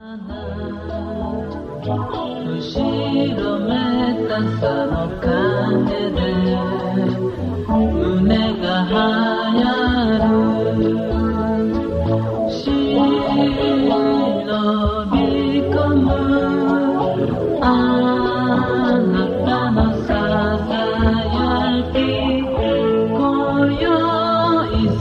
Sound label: euro suspense